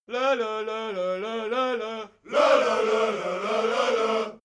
crowd musical bawling

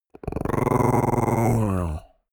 chihuahua growl

